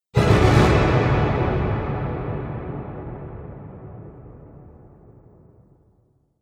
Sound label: Orchestra Horror Sting; Stinger